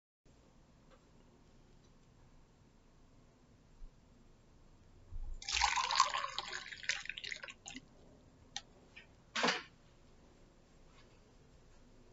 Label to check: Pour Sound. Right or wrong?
Right